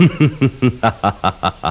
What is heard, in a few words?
LAUGH; psion cd vol1